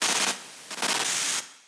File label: circuit error